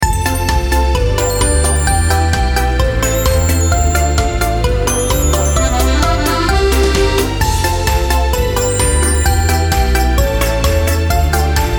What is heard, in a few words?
karaoke